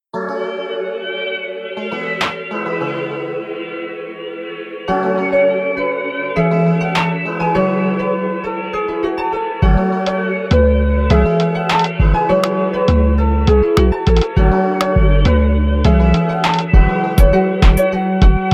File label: Instrumental